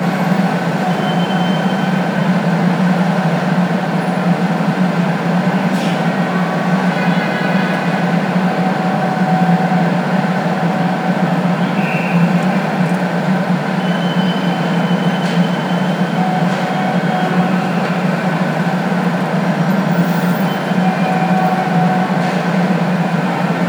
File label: city heavy; ambient; Project Gotham Racing